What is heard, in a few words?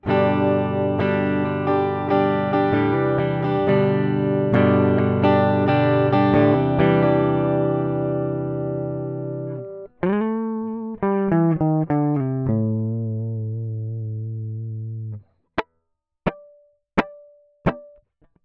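gtr, guitar; avid mbox pro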